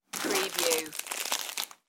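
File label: Grab Plastic Wrap Food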